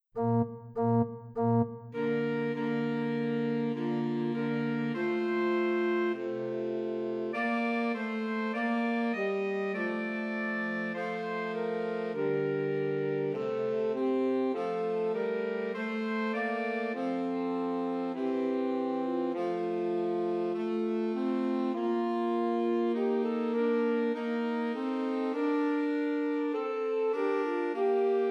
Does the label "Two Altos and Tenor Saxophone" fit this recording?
Yes